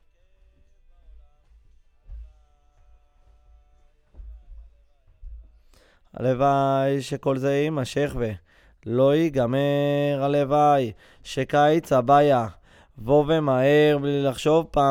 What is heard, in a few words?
VOC GUIDE